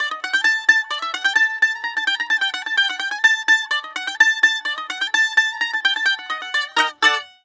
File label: BAGLAM D, Mediteranean